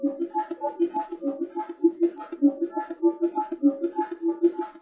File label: Bongo